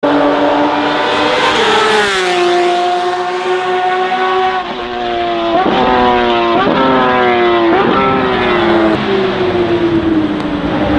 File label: 911 rsr braking